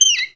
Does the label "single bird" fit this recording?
Yes